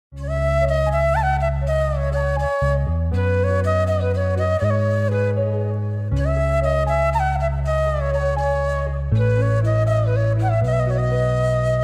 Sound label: Instrumental Ringtone